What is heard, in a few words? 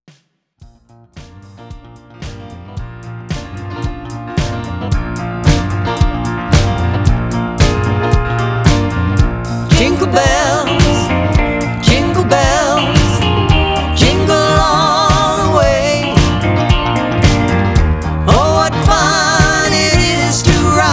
Jingle Bells